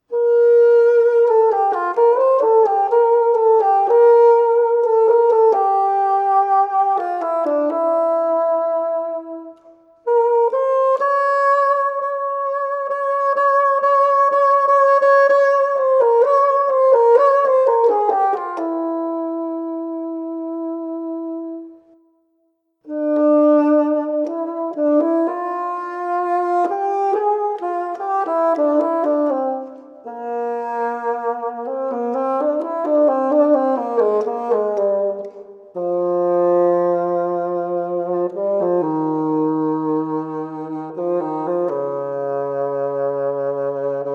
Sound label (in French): basson bolero